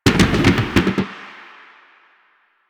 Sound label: Slide Boost Backfire, Motorcycle; Motorcycle Ninety Nine